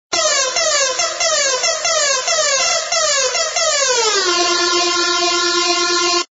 New Siren

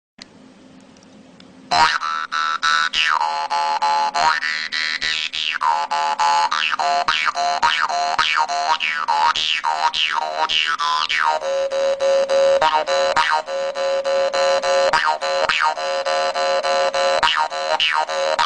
Jaw Harp Boogie

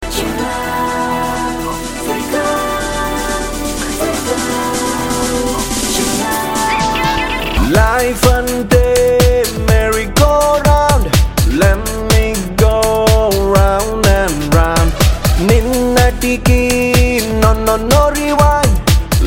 Chill Out

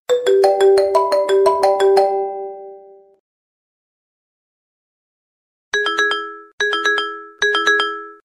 Simple S M S; Iphone

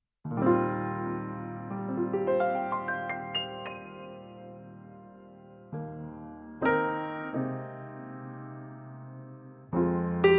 Upright Nord

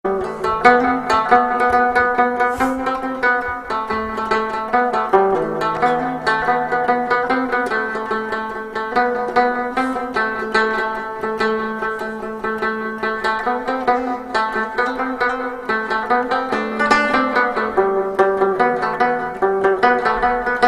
Rabab

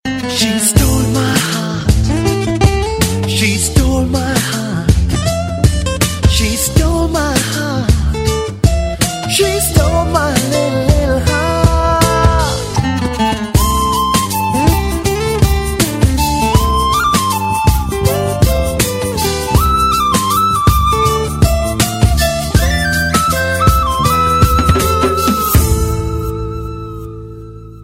Unplugged

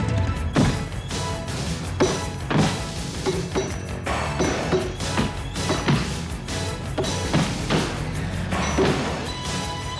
Chopping Tree

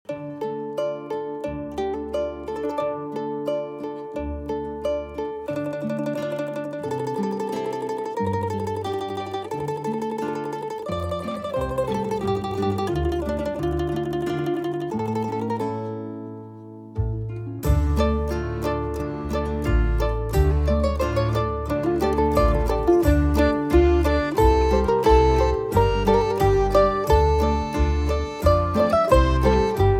Tavern; Divinity OS2

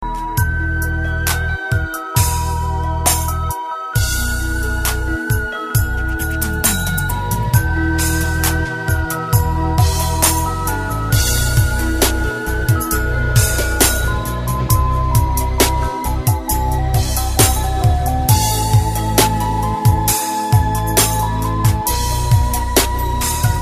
Flute

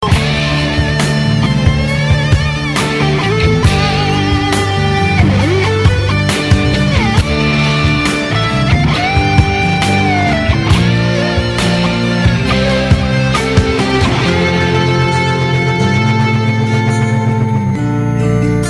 Electric Guitar Tone

